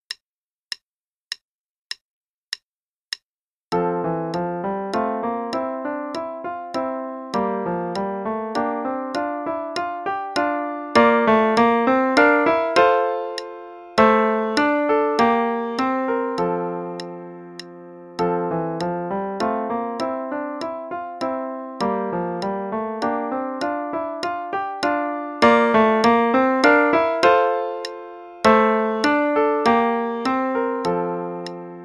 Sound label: Play along (piano only and click, qn=100); Rondeau; Purcell; lessons Trumpet